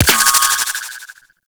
Shot; Warframe; Sentinel; Gremlin

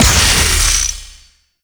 Archer Disengage Ability Explode; Warframe; Duviri; Dax Archer; Abilities

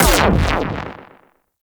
Biped Railgun Fire; Warframe; Corpus; CorpusRailjack

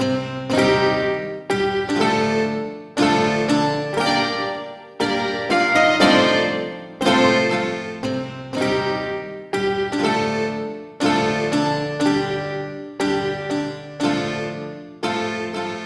Shawzin Bard Music Loop; Warframe; Duviri

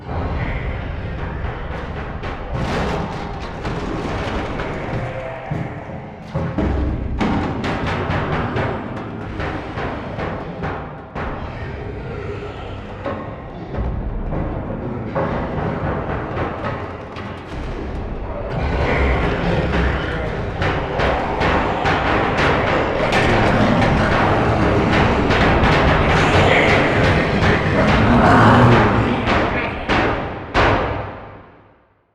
Hand Shake Ambience; Zariman; Void Kid

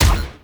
Step Small; Warframe; Corpus; Jackal Redux

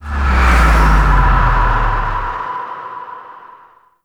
Pre Stinger; Warframe; Tau; Cinematics; CraterTransition

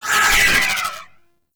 Void Dagger Proj By; Warframe; Zariman Dagger; Void Mode

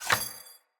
Bow Charged Shot Ready; Weapons; Player; Bows; DendritBow

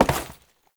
Step Default, Foley; Warframe; Oraxia

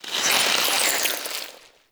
Infested Plant Murmur; Ambience; Props; Warframe; Infested Eris

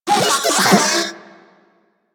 Vocal Detonate; Warframe; Corpus; Mini Moa

